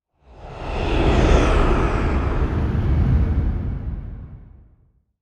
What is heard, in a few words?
Spider Cave Creepy Sweep; ambience avant gardens